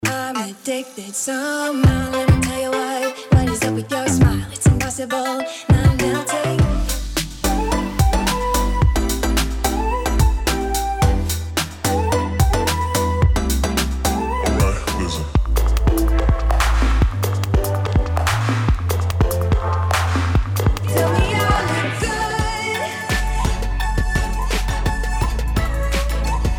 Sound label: Vibrant Pop